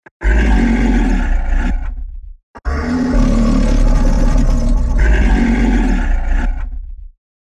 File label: Lion Roar